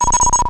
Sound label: BUTTON